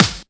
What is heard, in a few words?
piercing snare sample g sharp key; Bitdepth 24; snare drum